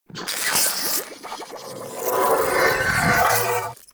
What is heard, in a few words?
Shrimp Lantern Weapon Charge Up; Creatures; Baliq